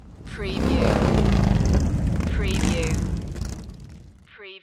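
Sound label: Motorcycle Drive By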